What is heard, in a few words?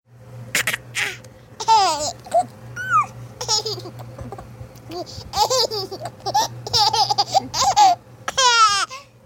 karma laughing